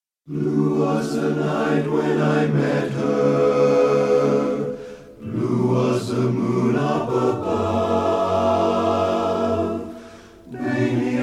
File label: acappella